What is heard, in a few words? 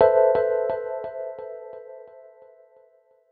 D&B Keys Stab; neuro